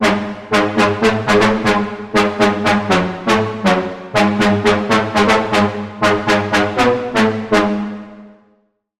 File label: Lead; Routed Sound; Vital Expansion - Noble Gangster Vol.1